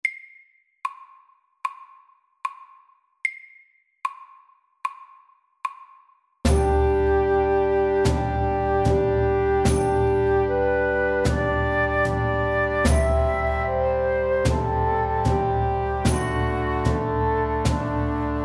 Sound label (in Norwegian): Engelsk melodi SAKTE